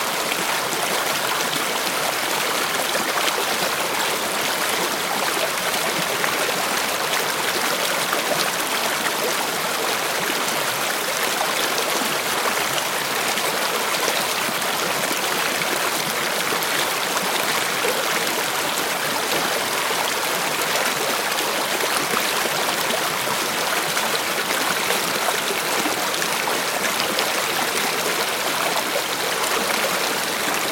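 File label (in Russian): холодные темные морские волны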